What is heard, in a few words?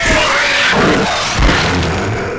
death; Alien Arena; nemesis